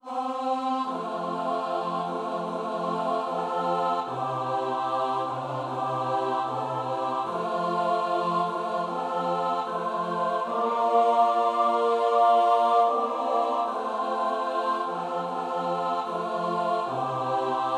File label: tutti